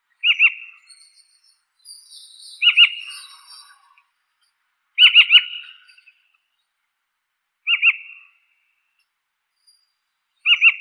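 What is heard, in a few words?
golden oriole, Oriolus oriolus, chick; ivolga obiknovennaya; ptenets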